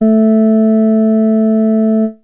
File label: Ganymede Organ Zeta 220Hz